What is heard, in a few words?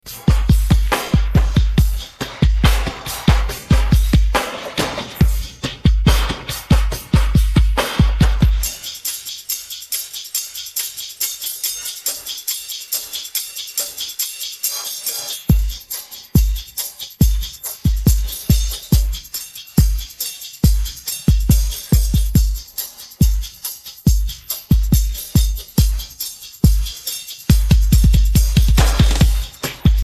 drums